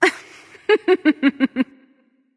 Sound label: hehheh; smite; thanatos